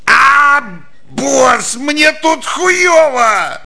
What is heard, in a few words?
hurt bad